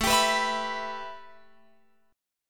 A 7th Suspended 2nd, A, down, Guitar, Open F; A7sus2; strummed

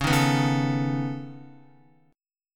Db 7th Suspended 2nd Sharp 5th, Guitar; strummed; x,4,1,4,1,4; JGuitar

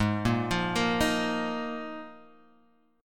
Bb 7th Suspended 4th Ab

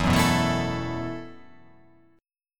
E Suspended 2nd Suspended 4th E, down; strummed; JGuitar

